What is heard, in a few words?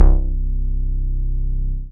BAS MINI, Mini-Moog